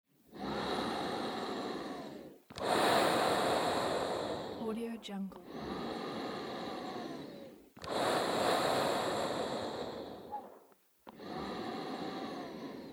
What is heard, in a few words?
gas mask breathing; نفس کشیدن با ماسک اکسیژن; human sounds